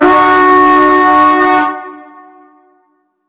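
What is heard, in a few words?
medieval horn alarm signal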